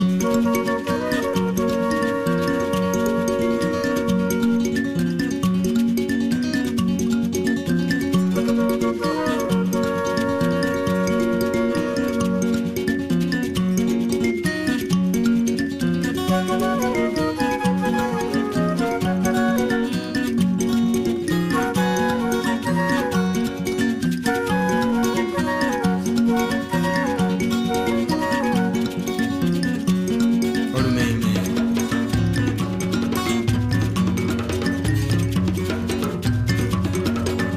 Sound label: Traditional (Luganda)